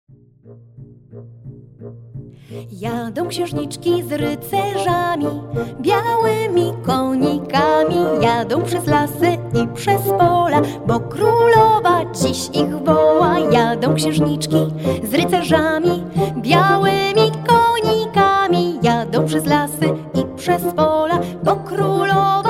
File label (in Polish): wersja wokalna